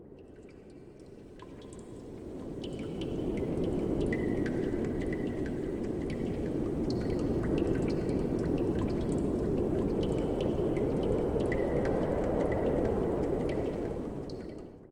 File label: drops, Mines; AMB; OneShot Fading Memory